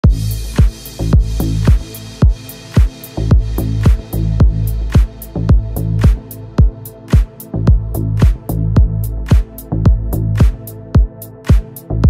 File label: deep house music